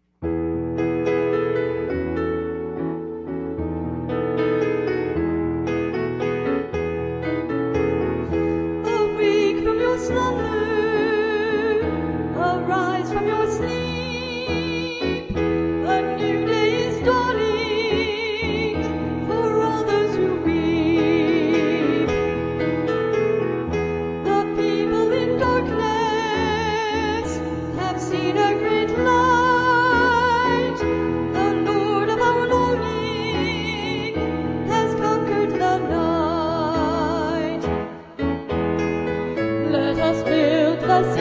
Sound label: Alto & Tenor; St. Anthony of Padua - Choir Sunday